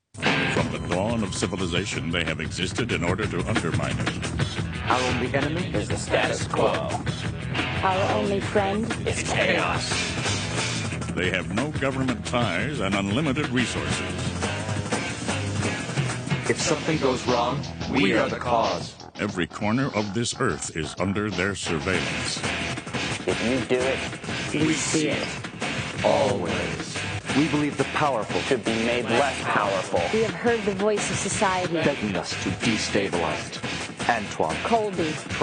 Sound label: jingle; upright citizens brigade